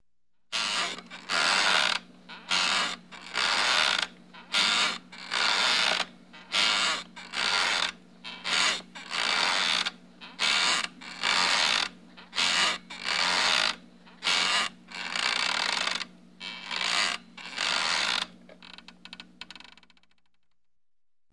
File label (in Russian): kreslo kachalka